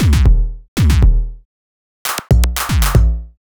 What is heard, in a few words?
Robot Thunder, Modular Electronica